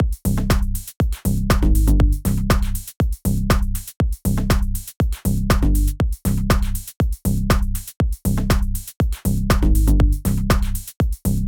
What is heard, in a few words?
bass